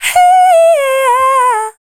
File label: Dance Vocals, FEMALE